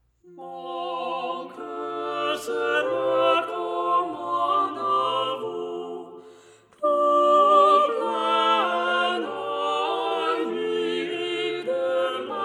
minus bass organic

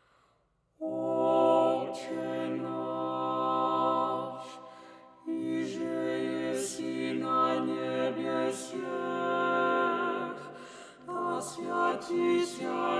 group minus bass; otche nash

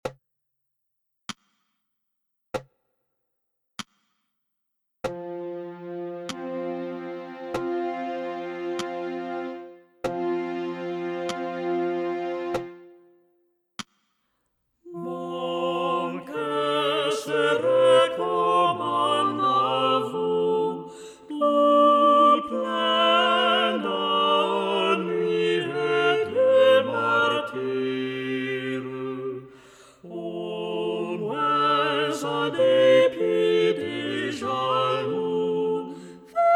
mon coeur minus alto

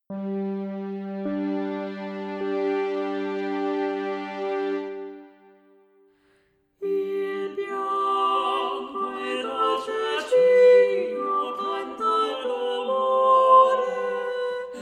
minus alto; il bianco e dolce cigno

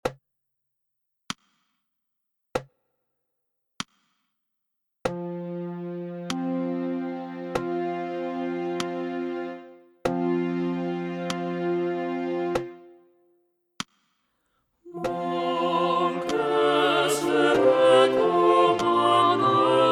mon coeur clicks full group

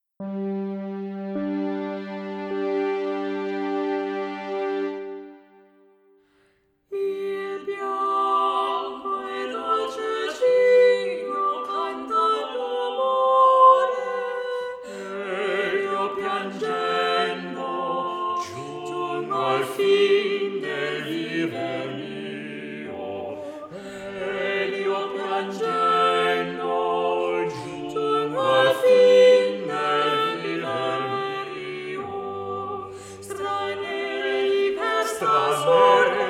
il bianco e dolce cigno minus tenor

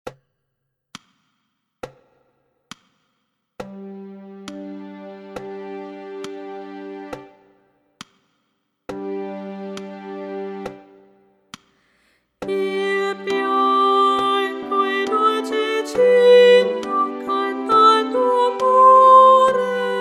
soprano with clicks

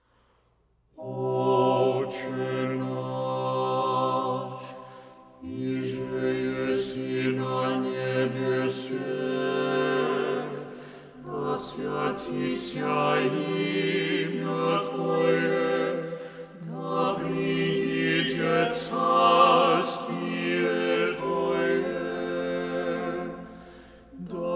group minus soprano; otche nash

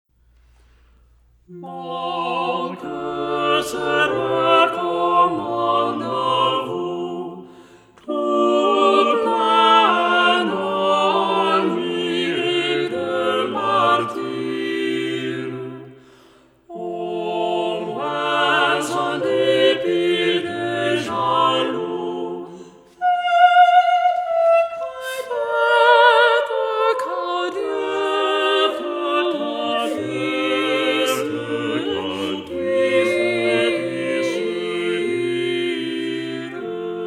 mon coeur, minus soprano

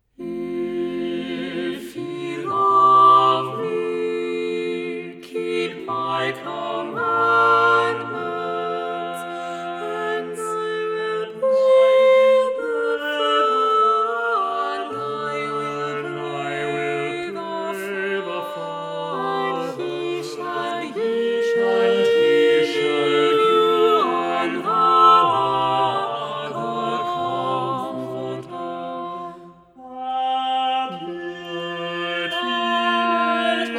minus tenor organic; if ye love me

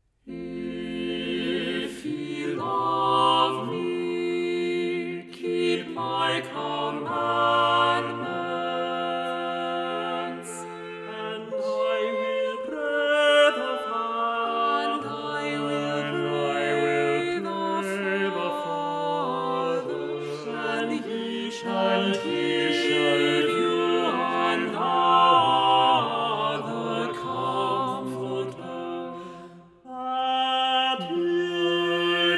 minus soprano; if ye love me

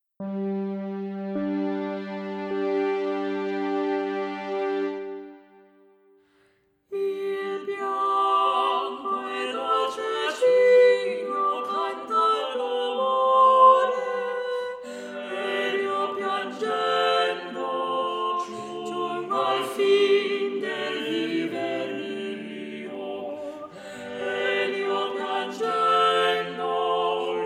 minus bass; il bianco e dolce cigno

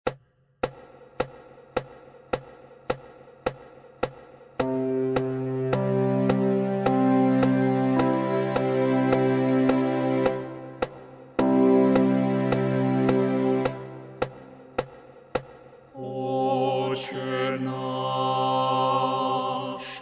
octhe nash minus soprano

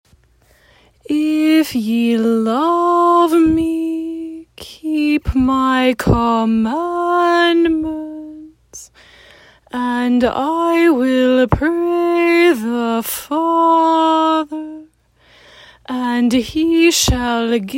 american english slow; if ye love me

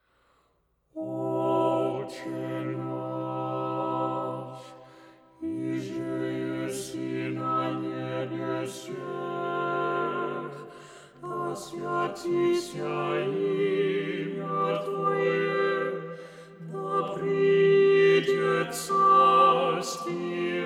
group minus alto; otche nash

